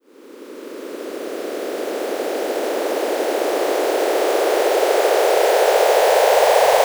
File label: Crescendo FX; Dance; SFX